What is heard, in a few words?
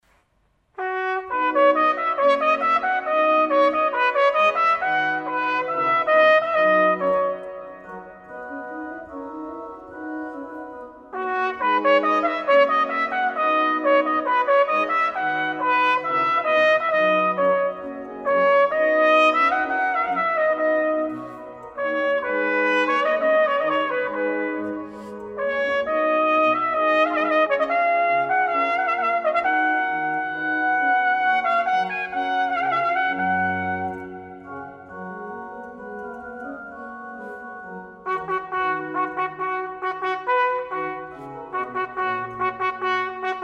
air de trompette